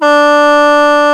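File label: D2 SHENG, CHINESE SHENG; AKAI S6000 CD-ROM - WorldSounds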